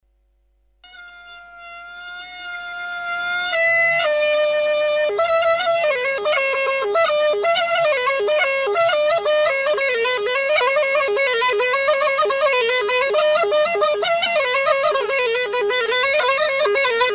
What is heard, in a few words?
Ney Anban Bushehr